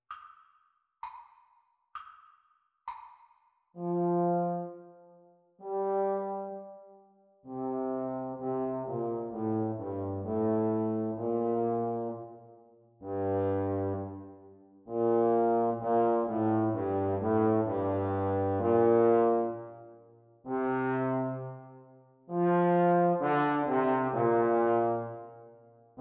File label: playalong for trombone practice speed